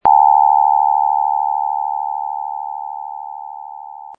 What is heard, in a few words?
kabong